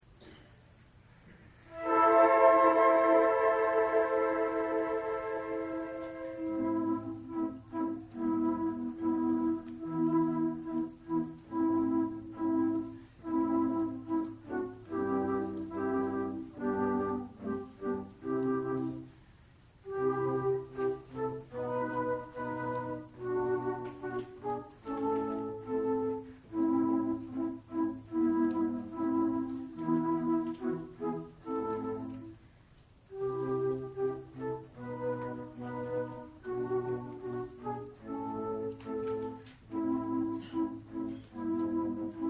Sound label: new year consert, flute